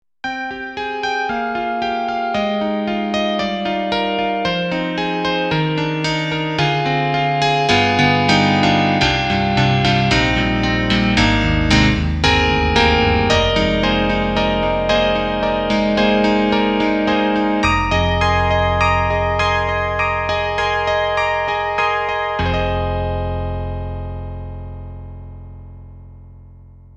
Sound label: EDIROL SD-90 Enh.E Grand